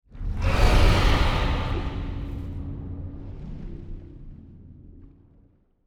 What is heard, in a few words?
dragon; Stellaris; aquatics